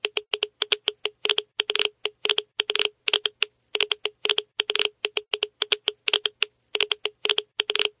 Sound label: low, Geiger